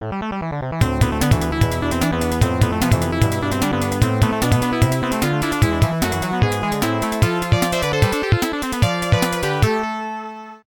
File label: WORLD CLEAR (FM Sound Source Version); Hydefos